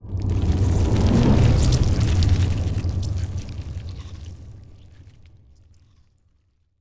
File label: ant ship colossus move; biogenesis